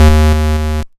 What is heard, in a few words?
YM Bass D#; ChipShop Deluxe; ChipShop Hits